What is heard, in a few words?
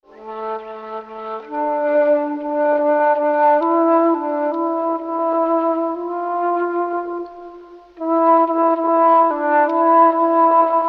flute, Loops, Sad